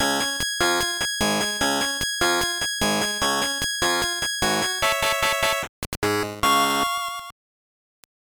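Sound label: Jingle, video game; game boy wars turbo 1997 sgb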